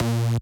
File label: bass